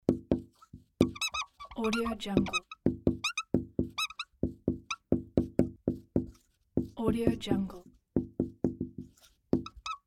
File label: whiteboard marker